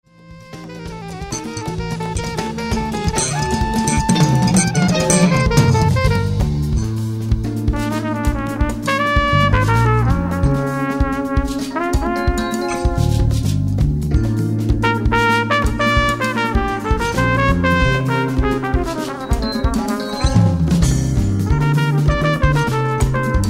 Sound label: Cave Trumpet Solo And Chant